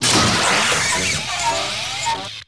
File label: baron Droid death, droids robots